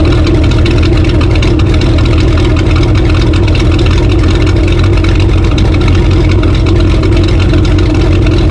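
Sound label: car tractor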